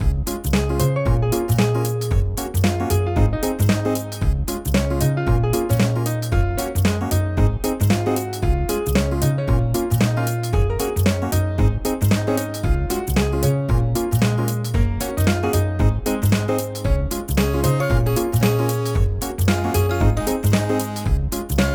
Apartment Theme; Catlateral Damage

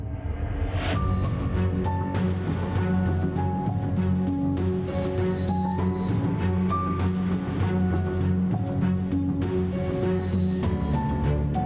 Boss Ready; Renewa

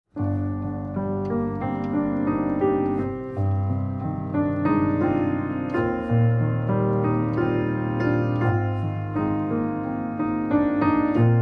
Piano; Clair Obscur Expedition 33 bonus tracks 2025; Sciel (Piano)

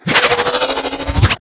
Sfx Baseball

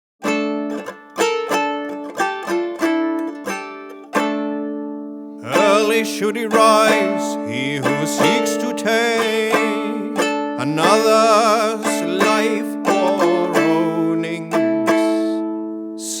kitara+drum+vocal+flute

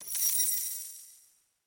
Item Machine Buy; Josh Journey Darkness Totems; Santuario

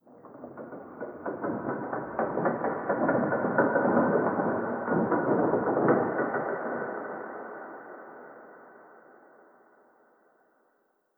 Wheels

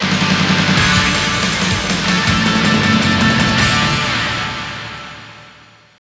Str G#

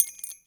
Coin Ring Gemstone Item

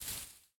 Footstep Foliage Bush-Big

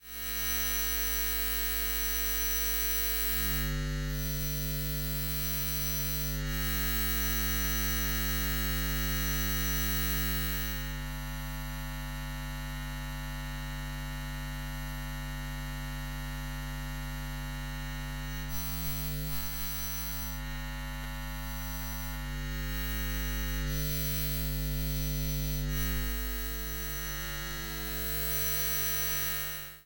Electromagnetic Fields; Electromagnetic Fields 01; AmbienceSabaku; freetousesounds

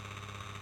El-Hum-Dirty Loop; Escape

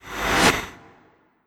Whip Swi; Legend of Keepers (gamerip) (2019)

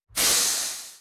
Mech Footstep Air

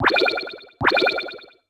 Activity Monitor Detect

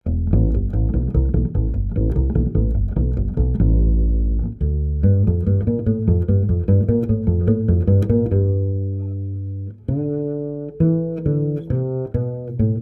bass play music; The Sims 2

